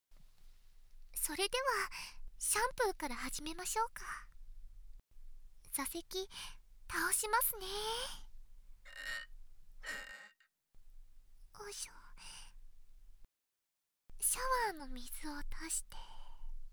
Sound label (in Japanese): 安眠寝落ちパート シャンプー＋オイルマッサージ＋耳かき, ASMR; 美容師さんは常連のあなたを癒したい ～あなただけの特別耳かきサービス～